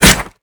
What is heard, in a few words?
bullet canvas, weapons impact; Call of Duty Modern Warfare